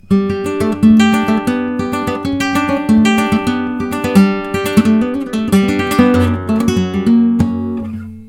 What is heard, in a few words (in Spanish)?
falseta